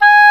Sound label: Oboe f; Roland L-CDX-03 Disk 1